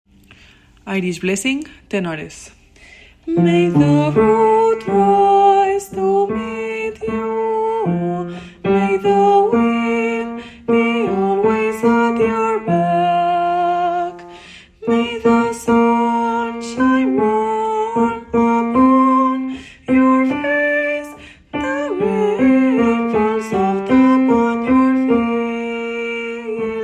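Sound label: tenor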